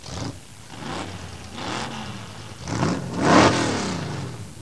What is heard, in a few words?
AUTO, car sounds